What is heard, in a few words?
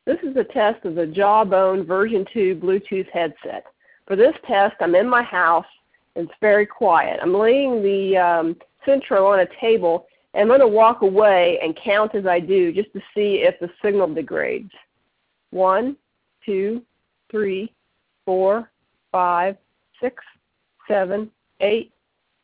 inside; jawbone2